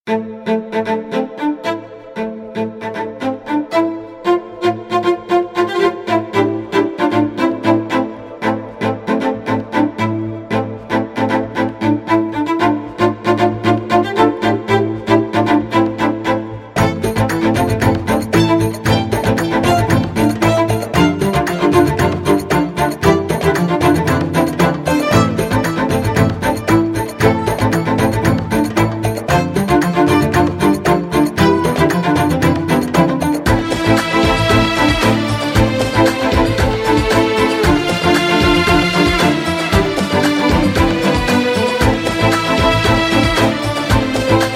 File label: staccato pizzicato mod gliss